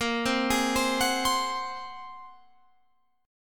A sharp 7th Suspended 2nd Sharp 5th, Guitar Open F chord; arpeggiated; 22,19,20,19,21,19; JGuitar